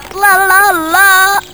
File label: correct